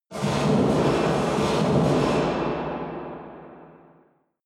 squeaking metal engine

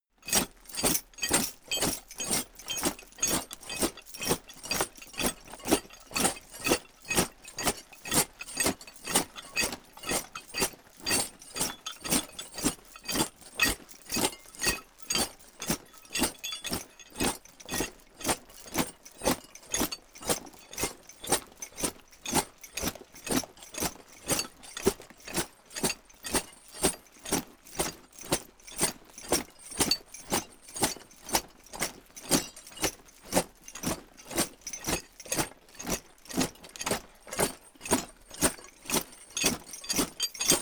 troops leather metal march fast

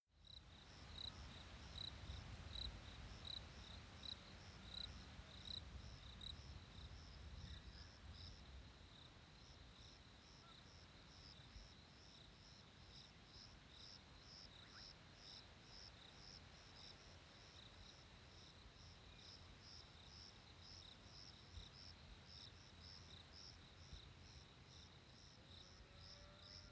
fresh winter crickets

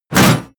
hit armor metal

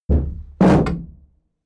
shield drop wobble

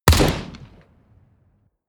shoot close

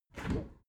window open heavy

